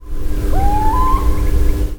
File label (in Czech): sýček vokální souboj samců